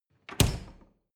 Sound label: refrigerator door slam